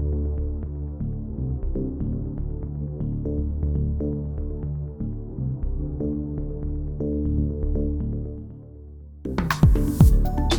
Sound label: platformer level loop; Godot